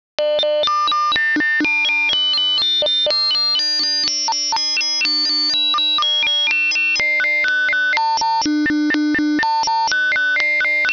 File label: red frog